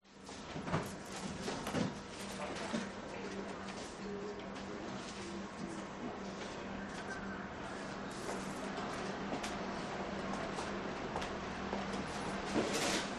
Post Office; audio hero

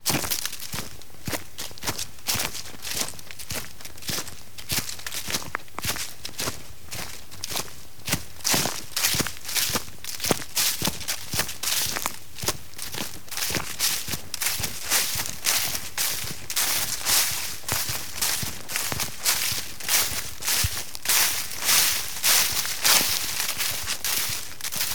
foley footsteps woods forest floor leaves etc

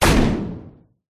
gun rifles single shot designed

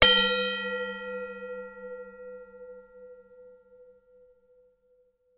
bell medium small bell ring

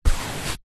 brush small hand held sweep single sofa; household; zapsplat

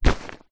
cool bag fabric grab with hand

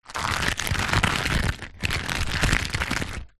foley latex rubber mask movements handling

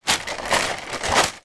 food plastic cereal packet removed from box

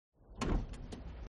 CONSTRUCTION GARAGE Car Lift Down Shaky; smartsound

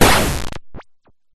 Laser-Like Synth Laser Noise Blast Oneshot; sound spark

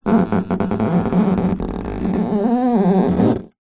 wood squeak polished floor boards hand; foley

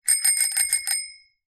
transport bicycle bell ring

